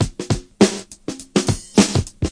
103 skippy, drum loops and breaks, 101 to 110 bpm

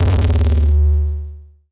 nasty stormfront, Electro FX

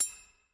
ding

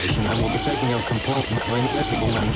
Radio Noise, radio tuning noises

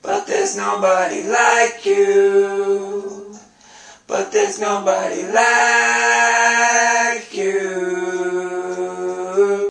but theres nobody like you, MALE SINGING

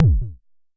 Jomox MBase, drum machine; Jomox MBase 01

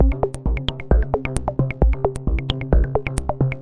132 bubble beat, DRUM LOOPS and BREAKS

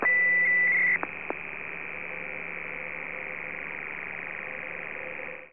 crunchy computer beep; Computery or Science Fiction

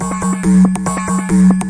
140 hexbeat, DRUM LOOPS and BREAKS

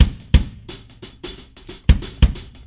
090 funki bd and hat

